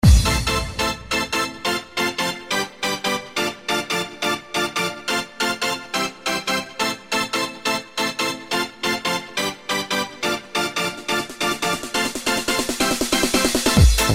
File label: Classic Trance